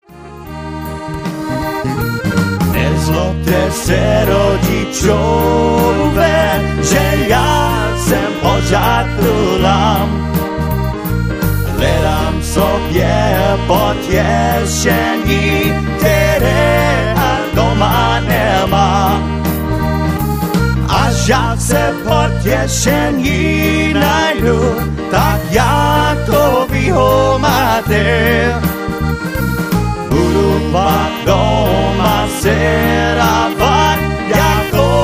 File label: Waltz